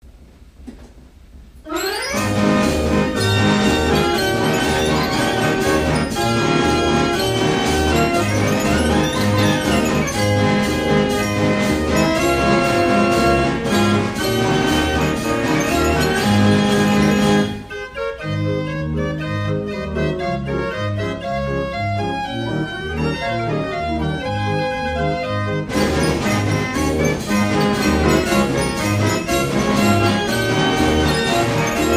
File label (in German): Marsch, draaiorgel; a ruth model 32; frans dauphin